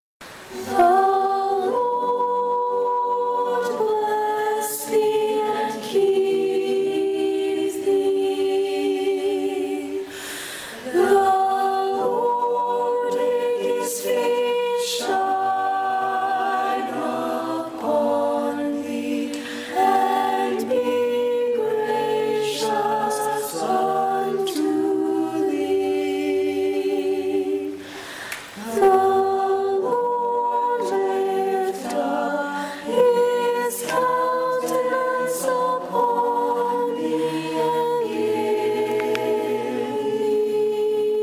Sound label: choir